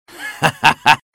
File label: snow jump; froststalk